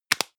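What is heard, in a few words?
deodorant can lid close hard, badezimmer; haus-garten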